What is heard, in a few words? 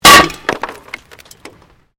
Glass pane impact no break; Material components for constructing more complex sounds